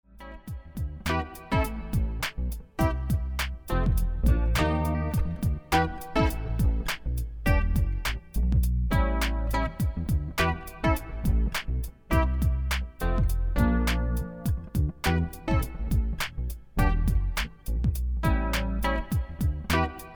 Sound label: Slow Funk